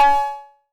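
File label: 808 Cowbell Tape Sat, Perc; Tape808; Goldbaby Tape808 & Tape909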